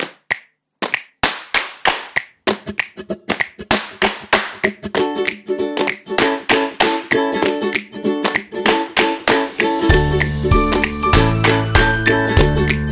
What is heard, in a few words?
Happy Children Positive Fun, happy music